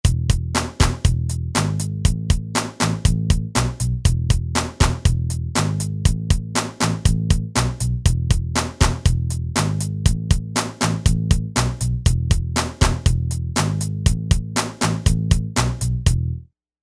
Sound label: Rock